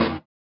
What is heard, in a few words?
SWUSH; Spectrasonics; Metamorphosis